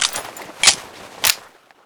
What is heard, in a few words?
reload, weapons; short stop; tf2